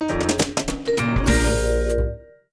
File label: Win Sting; curious george downtown adventure macos windows gamerip 2002